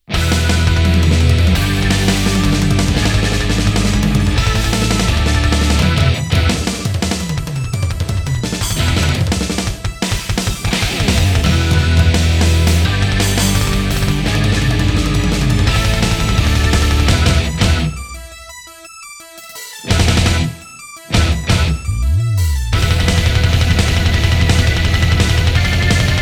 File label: Charge and discharge (backing track); Battle Gear 3